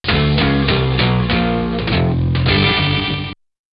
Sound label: guitars; "Mystery Sound Bite" Contest